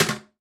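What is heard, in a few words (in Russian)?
zvuki sidenia dlia unitaza, toilet seat sounds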